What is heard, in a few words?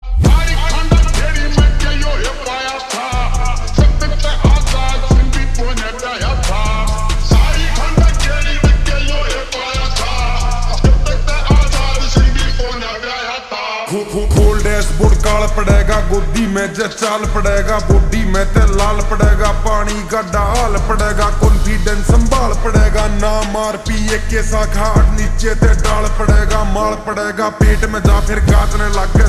Ringtone Slowed Reverb